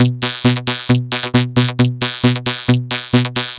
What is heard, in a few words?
Dance Drumloop; Drums; Dance Beats